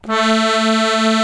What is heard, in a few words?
AKORDEON A 1, ACCORDION